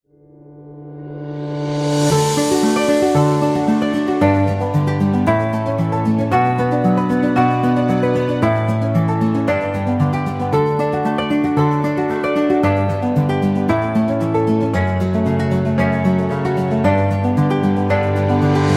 Upbeat Guitar

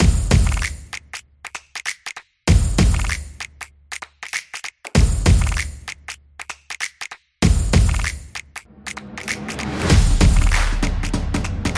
Drumming

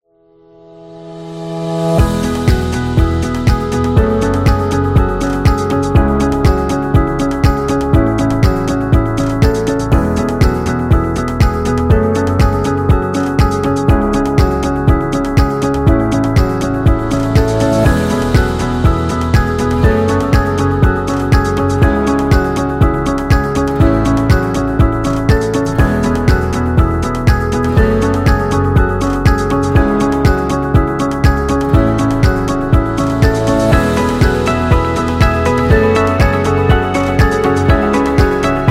Background Music for Presentation

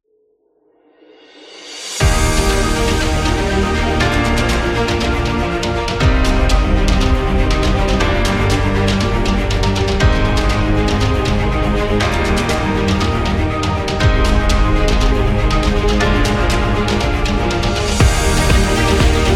Corporate Orchestra

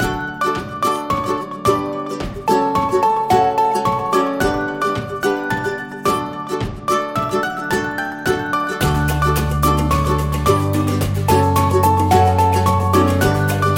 Summer Happy; birthday music

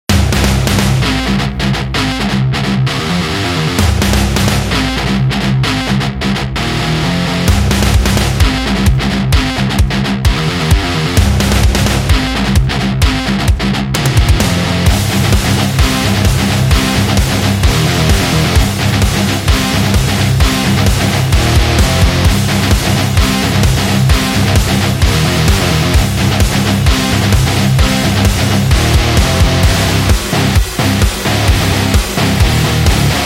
The Hard Rock

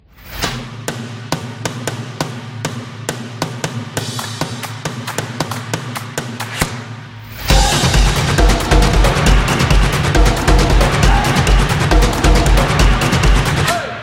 Dynamic Drum

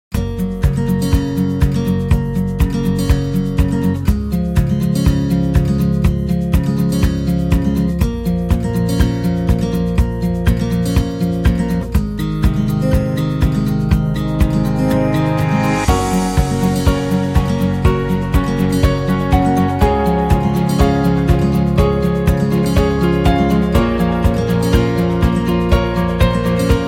Elegant Folk, happy music; happy music vol 7

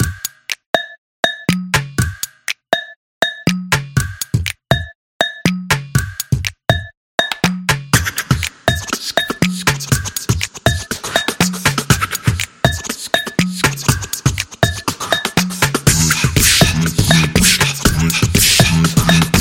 Percussion Beat

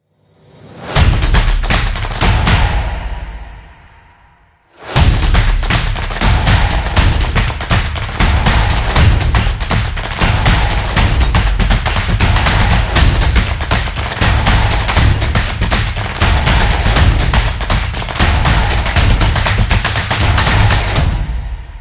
Sport Opener, football music

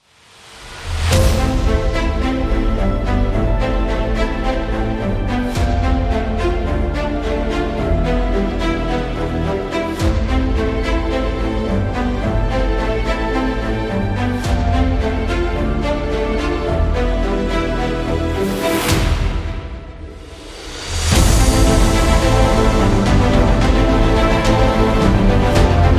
Cinematic Trailer

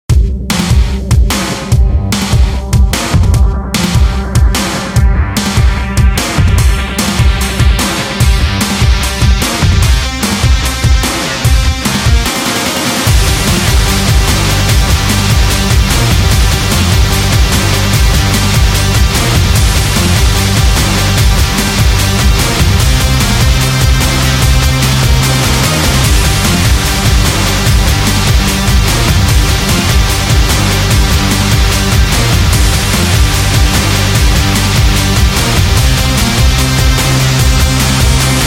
Car Trailer, electro rock

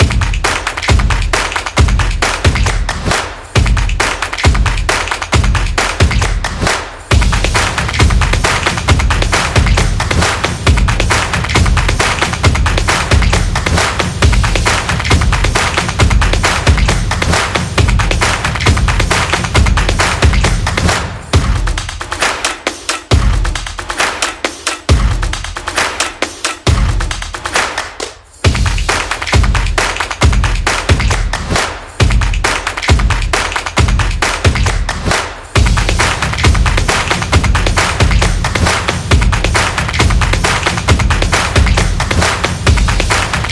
Drum Beat Loop

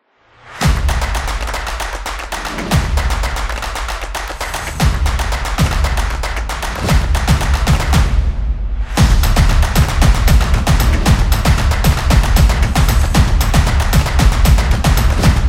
The Drum Beat

